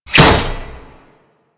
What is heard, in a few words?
Radial Blast Impact Metal; Warframe; Excalibur